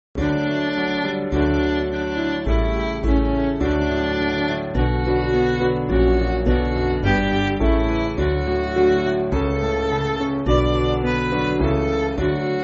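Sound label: Midi, Music Backing Files for Churches; How Pleased And Blest; Ascalon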